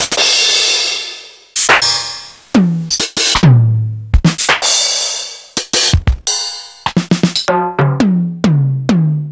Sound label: Oberheim DMX